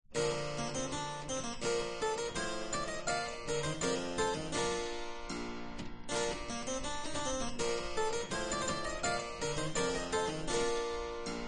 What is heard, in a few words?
baroque; anon